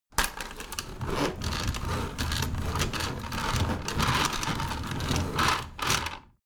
window roller shutter open medium

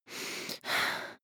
breath female aroused intense

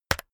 keyboard external key hit very fast

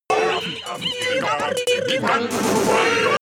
vocal glitch

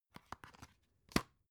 dvd case open

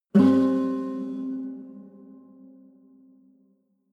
low string buzz dissonant

